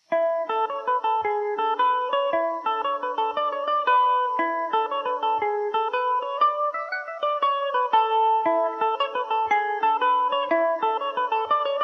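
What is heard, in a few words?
A major revelation, irish mandolin; The Skinful; Revelation Jaguar electric mandolin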